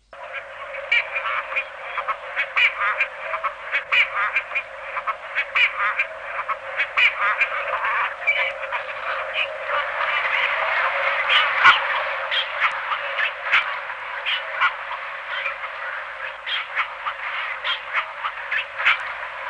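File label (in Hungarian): Kis kárókatona; Madarak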